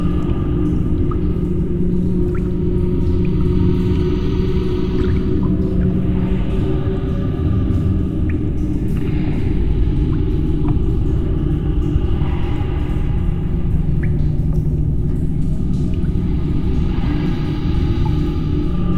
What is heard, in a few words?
underground wet loop; Left 4 Dead 2